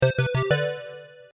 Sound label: bgm coin in; ユウラボ8bitサウンド工房; コイン投入とかスタートボタンを押したときとか